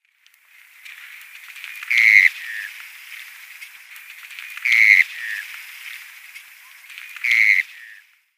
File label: Dotterel call